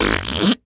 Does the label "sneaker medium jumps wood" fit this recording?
No